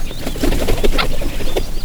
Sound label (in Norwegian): vinger